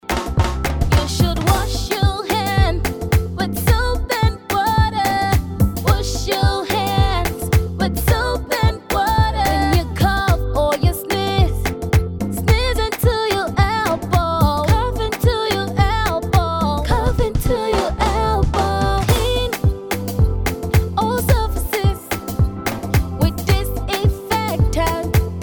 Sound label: Theme Song hygiene English